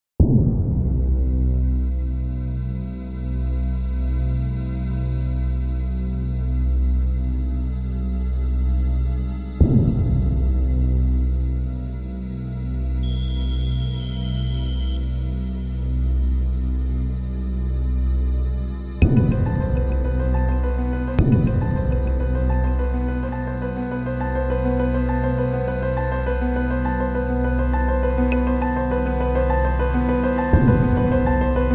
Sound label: Music Ambient; nosferatu the wrath of malachi